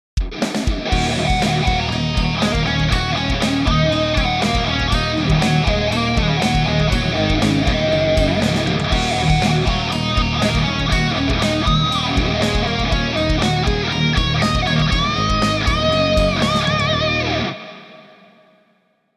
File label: With The Lead